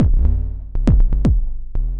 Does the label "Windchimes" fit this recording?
No